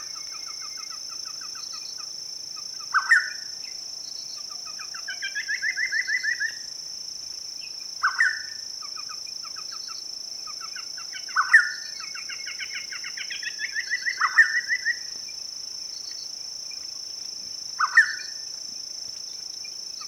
Spot breasted Scimitar Babbler Pomatorhinus mcclellandi